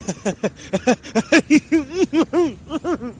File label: fake laugh